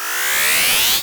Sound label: Sweeps Short